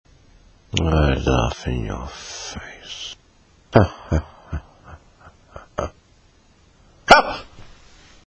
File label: laugh